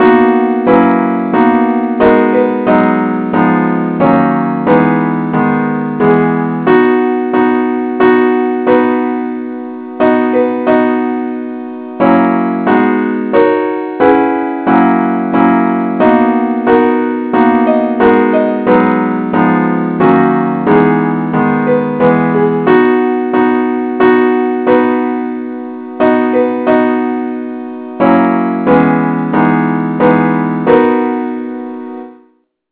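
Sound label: keyboard, A Major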